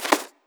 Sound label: card play